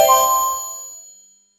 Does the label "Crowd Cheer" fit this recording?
No